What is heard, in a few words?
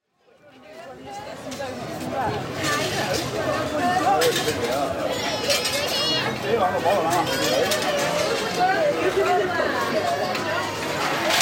seaside; Holidays